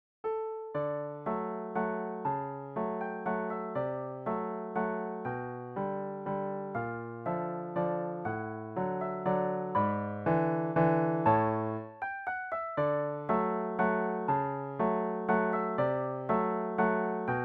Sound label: Waltz